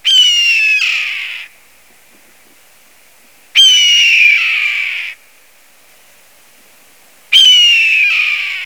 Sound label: red tail hawk, Bird Calls; Ornithology